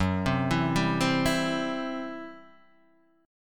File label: C Major F sharp